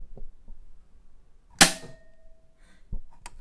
Power Cut

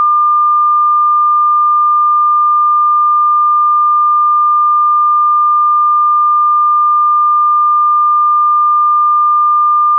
Heart Beat Monitor Flat Line

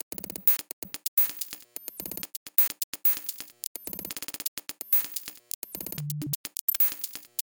Clacky

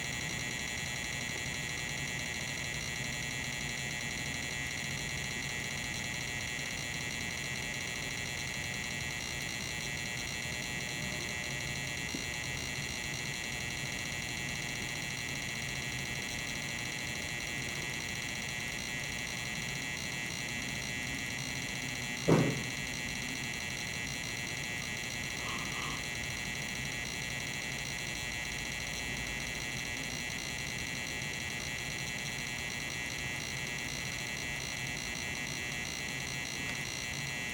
Neon Light Flickering Rapidly Traffic

